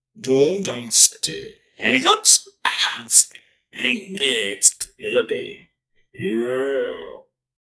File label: we love beer, cheering